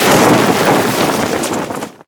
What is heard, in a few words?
cloth long